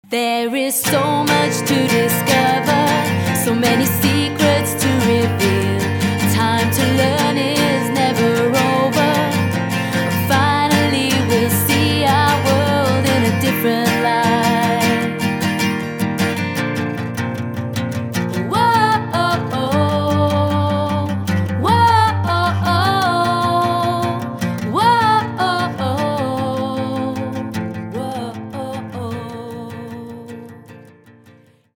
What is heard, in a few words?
acoustic Refrain